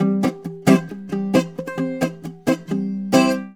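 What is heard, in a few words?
bouzouki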